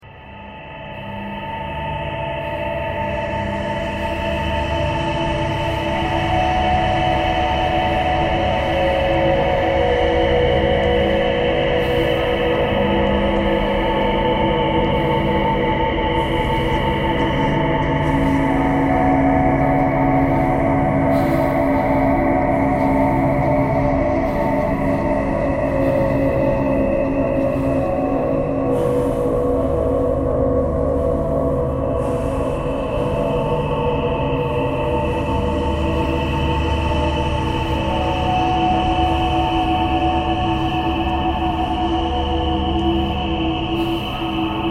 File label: thread pentatonic